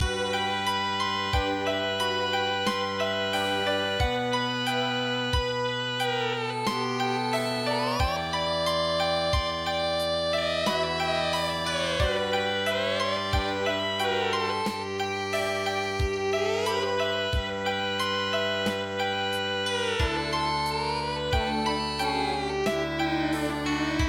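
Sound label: China Erhu Music; Dao la ji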